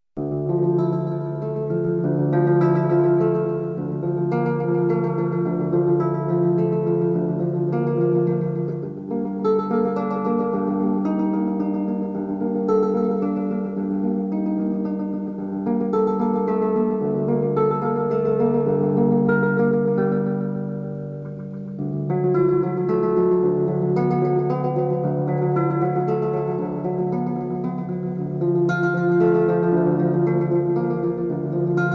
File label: Prelude 2 for 19 tone guitar